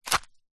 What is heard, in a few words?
footstep single sandal woods ground stoney path; zapsplat; foley